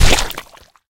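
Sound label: hit weapon liquid blood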